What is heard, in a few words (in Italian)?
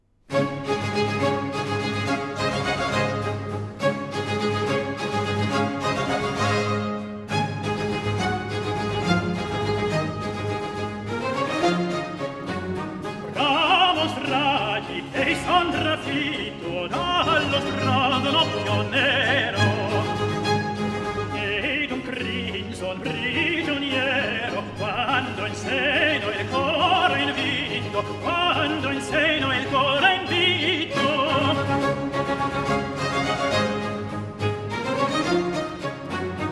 Aria; Atto 1 - Scena 11; Geminio; Bramo stragi, e son trafitto; Antonio Vivaldi; Tito Manlio (2005)